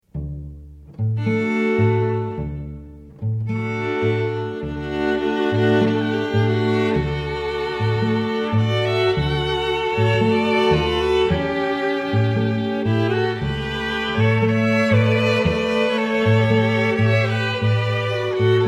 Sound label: Spanish Dance, string quartet